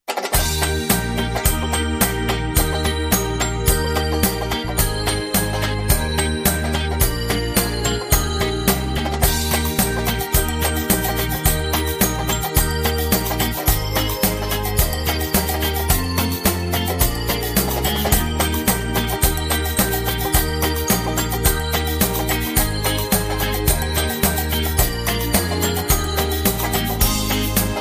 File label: jingle bells